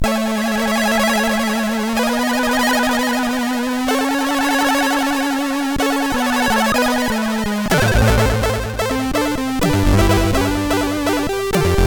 Waveform test, CSG8580R5; hvsc

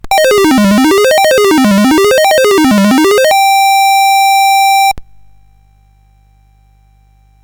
Dragonworld sid CSG8580R5

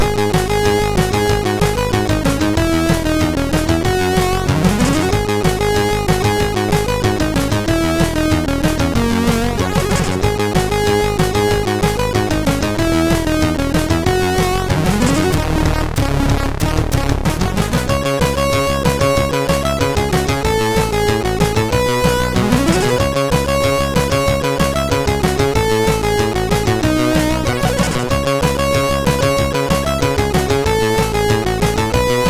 Intro, MOS6581R3; Pleite Geier Intro

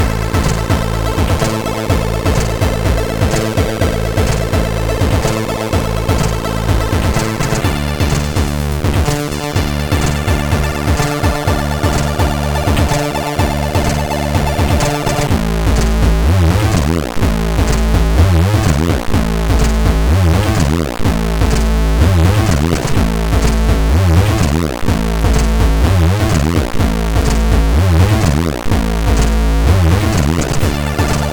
Check Sound sid CSG8580R5; hvsc

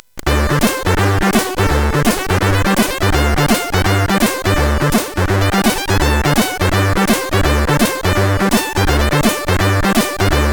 JAZZZZZ, CSG8580R5; hvsc

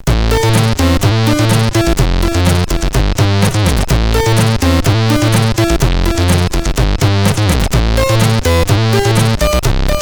Good Bass, CSG8580R5; sid

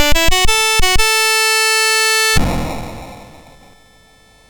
Charge, MOS6581R2; Imagineering Inc

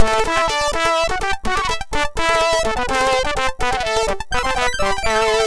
Test Medieval, MOS6581R3; Driver 14 Test Medieval

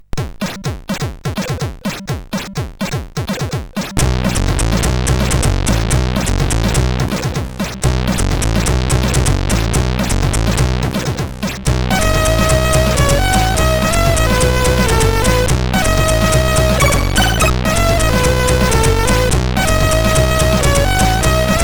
Panda Contact Note, sid CSG8580R5

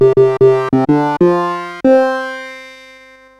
CSG8580

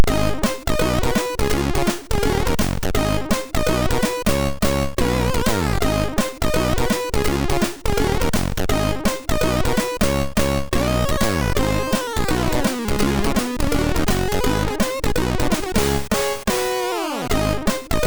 Blues, sid MOS6581R2

